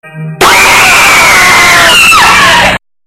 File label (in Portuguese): grito fantasma